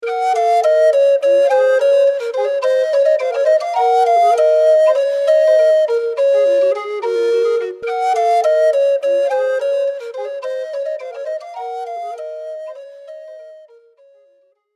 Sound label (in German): floeten fade out